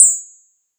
Borg Cymb, drum kit; Polyend; Stazma & Concrete Collage; Concrete-Dual Borg

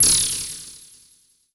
CABL TEN, CABL TENS; Total Control - Action Cutz

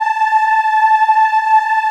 A4 WOM EH, Choir; USB Soundscan vol.28; Acoustic & Synth; AKAI

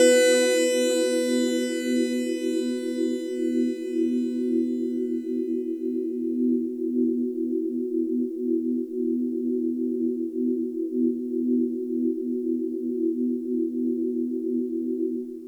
Long Drone, Pads; Sonic Foundry (Sony Creative Software); Synchro Funk

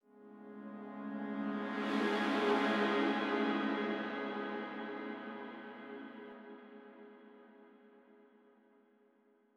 pad g; bald runner; M8

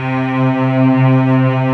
Textural Strings

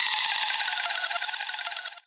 GTR DRIP, Gbm; Rawcutz - Nu Forms

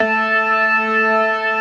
STOPS, ORGAN

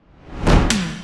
fill, fills

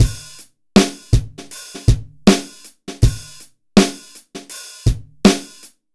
BPM 80 AMB; AKAI S-Series CD-ROM Sound Library VOL-4

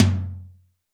tom, Zoom MRT-3B

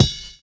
SNAP; Univers Sons - Kitch 70s

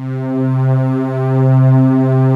hybrids, JD8 pads

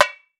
TAMBORIM; World Combo

